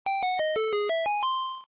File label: secret discovered; zelda